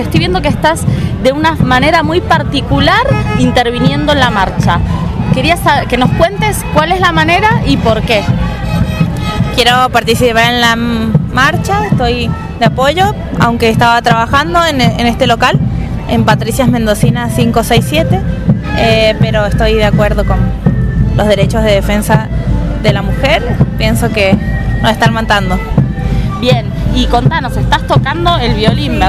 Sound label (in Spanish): violinista